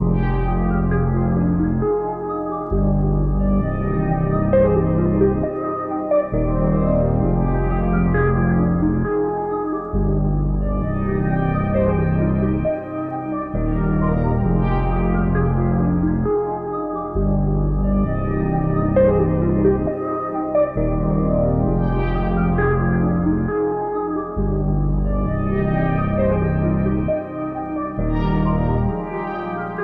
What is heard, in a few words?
133 BPM C Min; Cymatics; Zodiac III Beta Pack; Zodiac III Production Suite